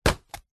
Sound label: foley footstep single barefoot walking cardboard